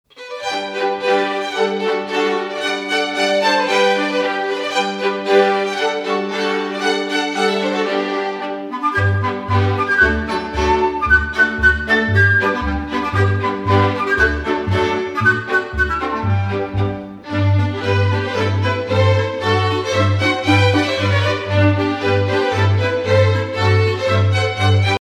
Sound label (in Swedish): hoppvals